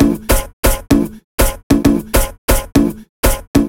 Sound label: beat